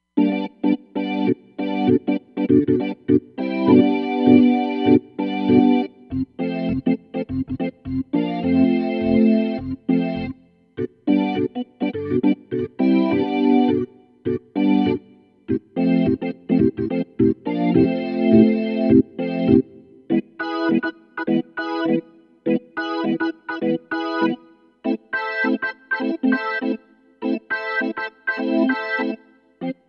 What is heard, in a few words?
Organ, Roland Fantom XR